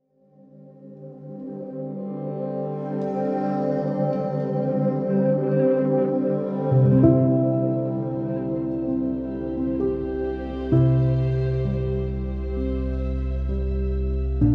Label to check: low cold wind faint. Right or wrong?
Wrong